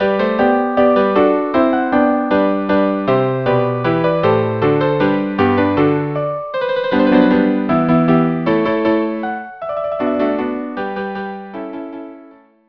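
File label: quartet; Telemann Helden 12 La Rejouissance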